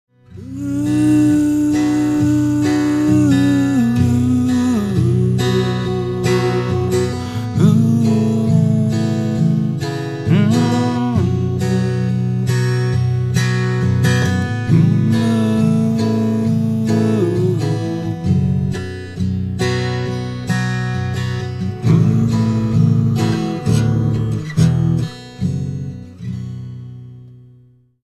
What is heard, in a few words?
noodle